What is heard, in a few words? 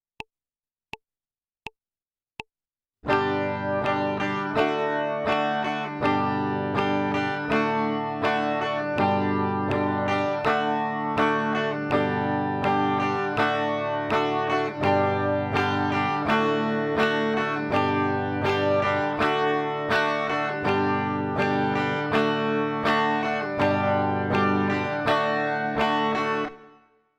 chord change g d, guitar